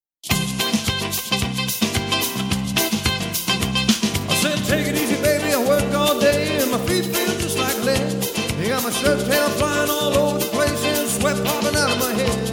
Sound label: Bossa Nova